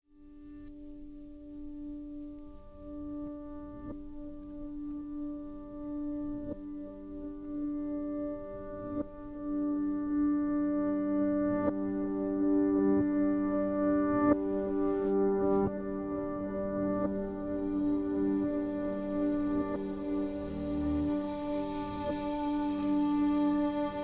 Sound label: Underscore, Piano Noir; Narrative Mind